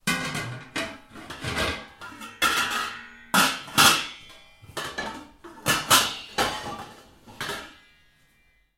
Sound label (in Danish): KØKKEN Gryder